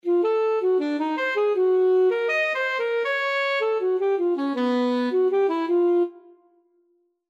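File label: Intervals Heavy chromatic sax; 7b Intervals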